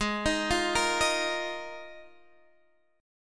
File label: Eb Suspended 2nd Suspended 4th Ab